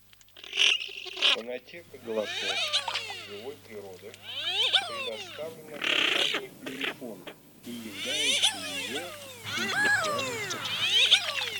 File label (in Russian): Красноголовый нырок Moriglione; plurifon